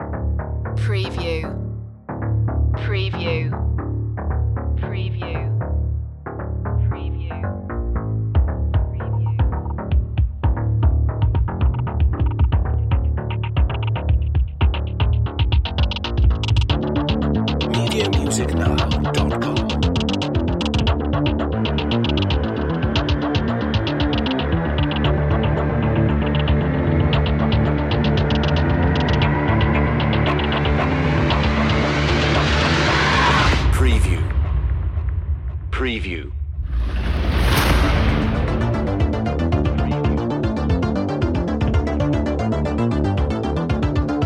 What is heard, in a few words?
end game no brass